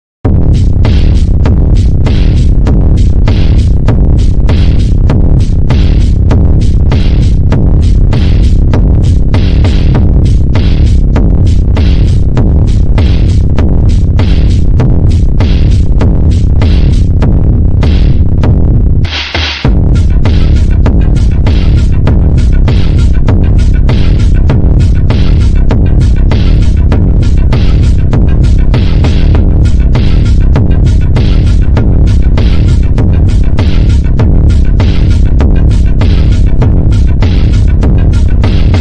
ultra slowed reverb

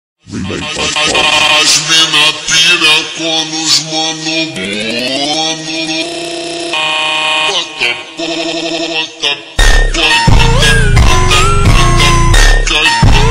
montagem super slowed phonk

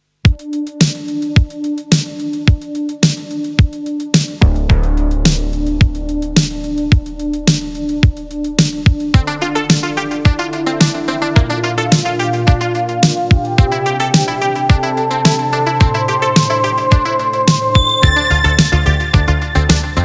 instrumental cover; franck choppin

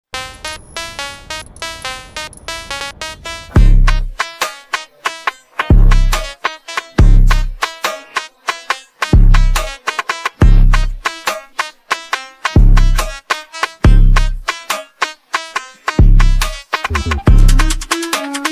instrumental

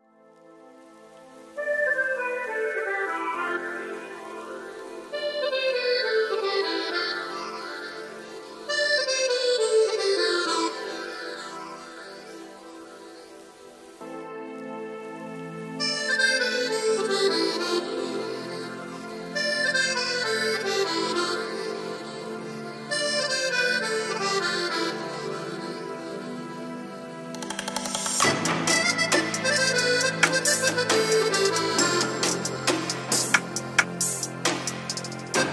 kavkaz style

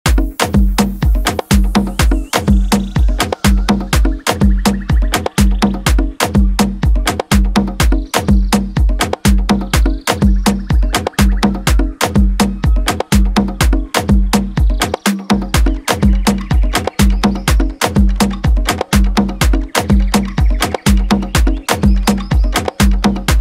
afro house

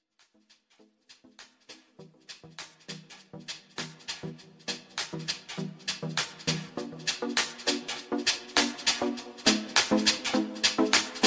slowed reverb

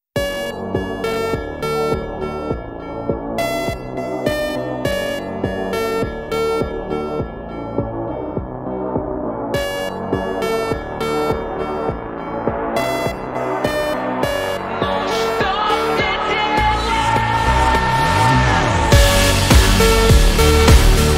slowed reverb

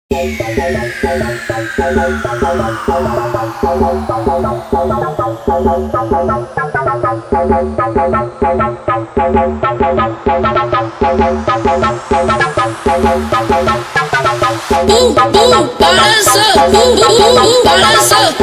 phonk

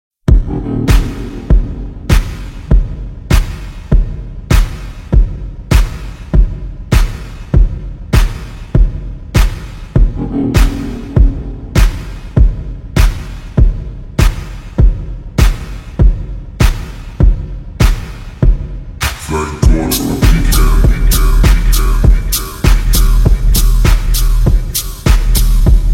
slowed reverb bass

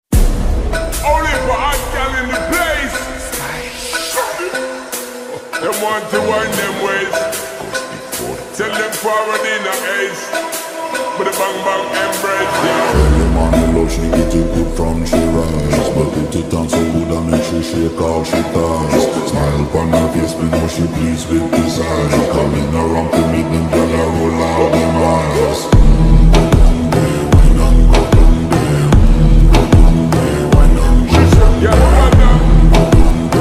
remix slowed reverb

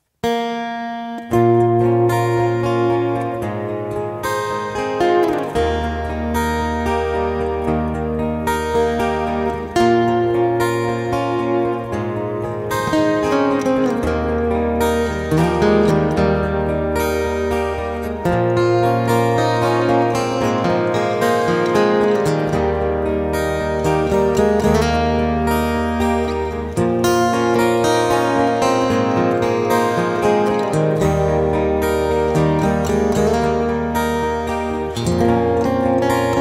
acoustic guitar cover; kfir ochaion

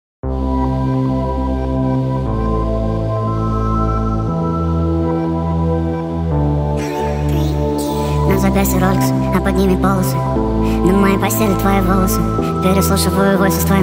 speed up

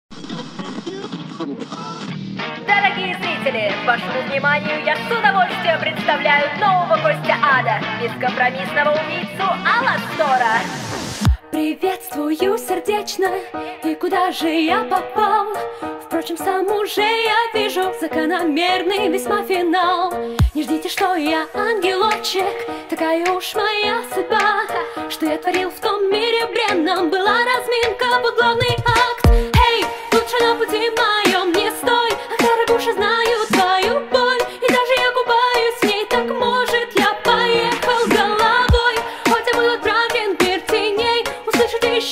female cover; кавер на русском; Hazbin Hotel song

